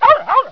DOG, animal

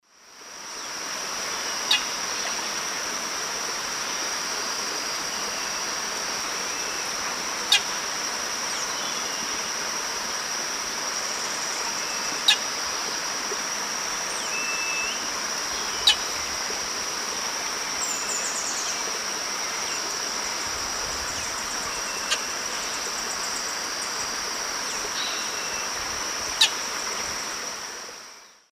Molothrus oryzivorus, calls; S. America; Eastern Lowlands

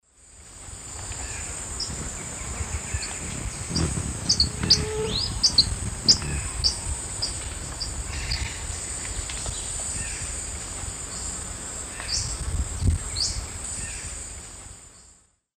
Phaethornis bourcieri, flight call; S. America; Eastern Lowlands

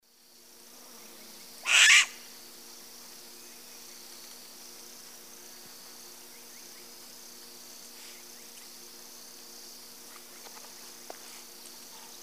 Asio clamator, alarm calls pair; S. America; Eastern Lowlands

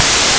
Metal Beat; Sound-System

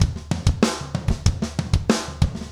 rock toms 90

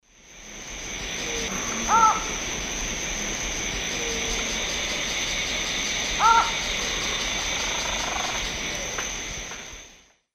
Thamnophilus cryptoleucus, call; S. America; Eastern Lowlands

